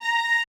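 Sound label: VA Q F#A4-L, viola, orchestra; AKAI Advance Orchestra Upgrade 97 Vol.1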